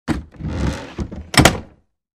wooden door closes with creak

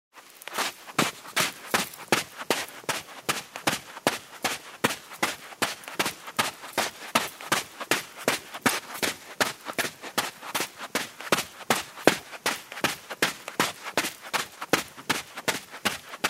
Running on dry sand in shoes; steps

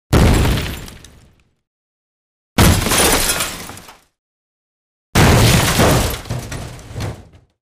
Different explosions with shrapnel

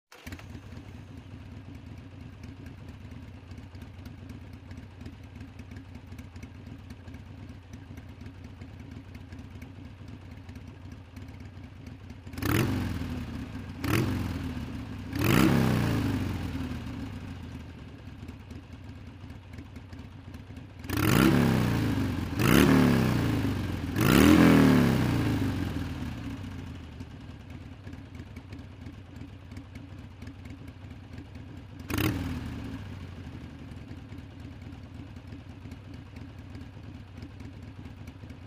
Motorcycle starts, regassing, jamming

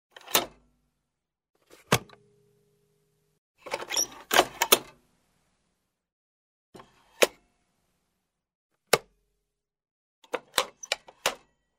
Cash drawer, cash desk; money

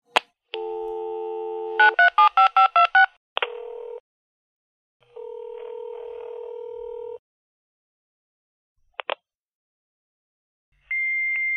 Modem connection, signal, interference; computer

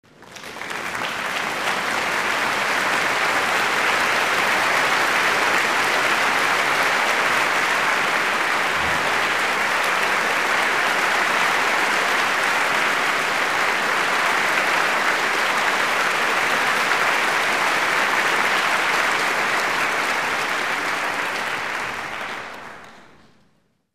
Applause in the concert hall; standing ovation

